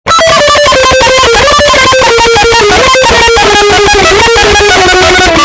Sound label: Monotone